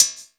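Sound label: closed hi hat, Drum & Bass; Ueberschall; Drum & Bass Resonance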